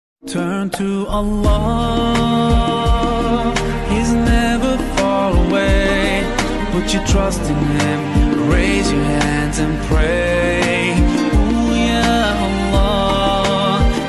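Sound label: english islamic ringtone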